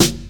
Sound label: sharp rap acoustic snare f sharp key